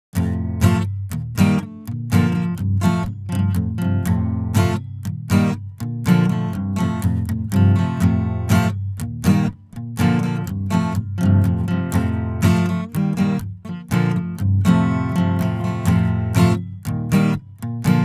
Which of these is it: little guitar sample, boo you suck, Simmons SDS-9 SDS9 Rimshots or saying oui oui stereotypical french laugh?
little guitar sample